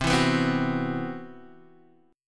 C Major 7th Flat 5th C, Guitar Standard; CM7b5 strummed; x,3,2,4,1,2; JGuitar

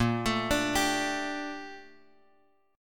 Bb Major 13th Bb Bass Standard; arpeggiated; JGuitar

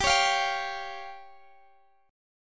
F Suspended 2nd Sharp 5th G

